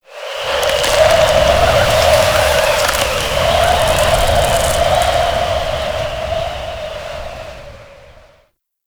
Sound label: Settlement Wind Debris One Off; Ambience; Warframe; Grineer Settlement